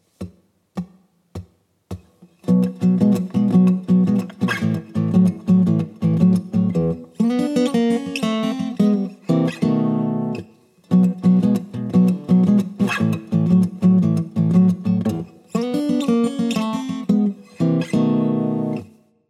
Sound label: Blues Guitar Turnaround